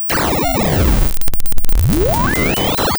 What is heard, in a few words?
pop